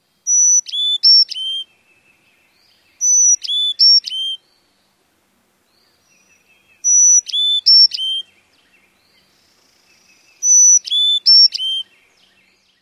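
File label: four-note song, Carolina Chickadee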